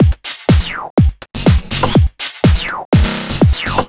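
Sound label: 123 Random Phunk Bass Drum, Drum Loops; 123bpm; Nu Dance Electro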